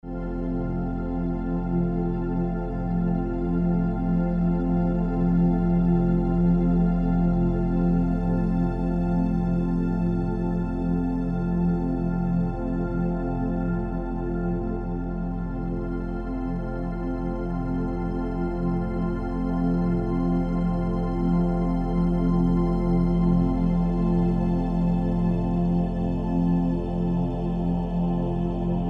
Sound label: lemuria healing sounds